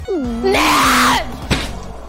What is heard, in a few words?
raging; uruha rushia